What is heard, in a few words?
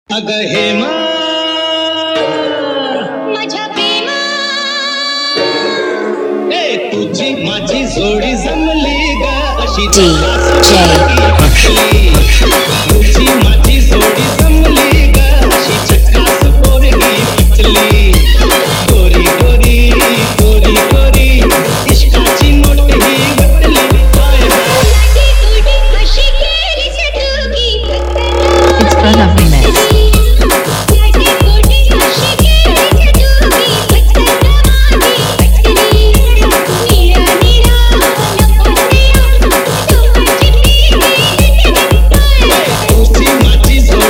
DANCE MIX